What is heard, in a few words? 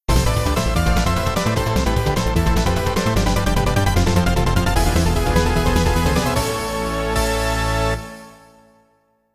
Jingle, MT-32; Niko 2; PC-9801